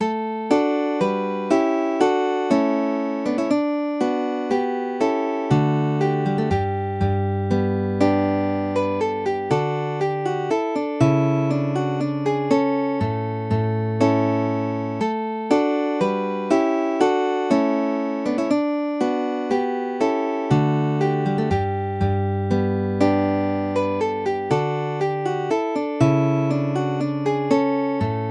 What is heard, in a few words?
two guitars courant; lawes